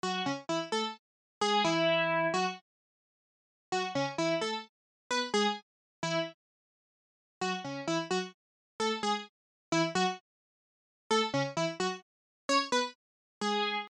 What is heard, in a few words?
Future Synth Wurly Stab